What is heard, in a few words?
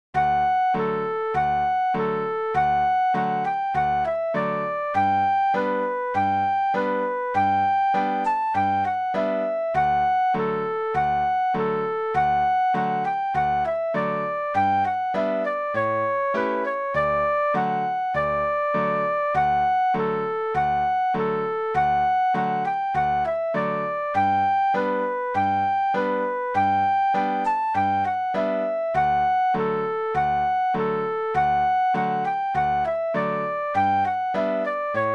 Liberty simple 100bpm